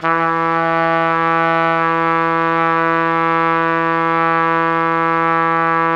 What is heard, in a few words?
E2, Pop Brass; Best Service ProSamples vol.19; AKAI